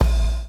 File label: BLOW; Spectrasonics - Backbeat